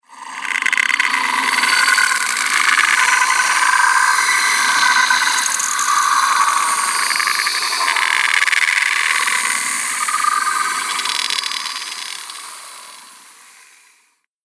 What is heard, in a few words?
Frightening Drone; Scary Soundscape Sound Effects; Horror Sound Effect